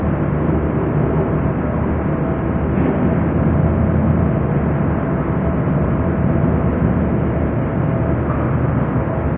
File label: amb industrial muffled lp; video games; ambient